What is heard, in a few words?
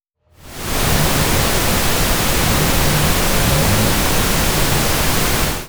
Rev